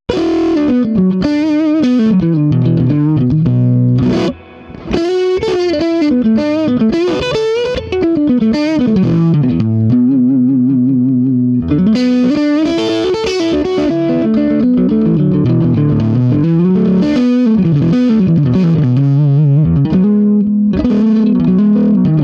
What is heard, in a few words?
Swart Amp